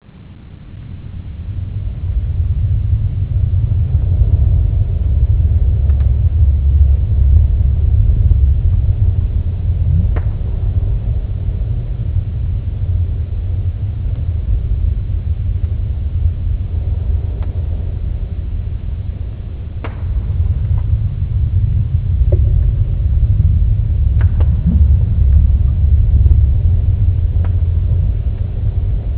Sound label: volcan